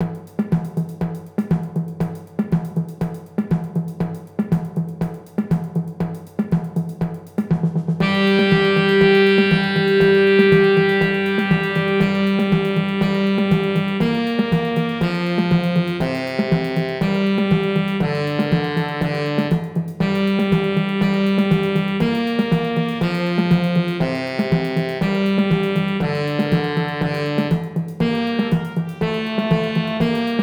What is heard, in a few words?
samba grove